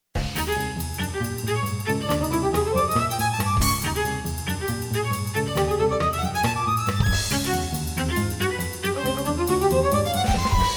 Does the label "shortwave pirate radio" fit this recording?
No